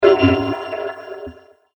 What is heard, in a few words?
Space Impact Strike; Sci Fi Sound Effects